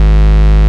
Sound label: Bass, Root G#; Vengeance Samples